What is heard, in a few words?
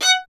STR VLN, Solo Instruments; Miroslav Vitous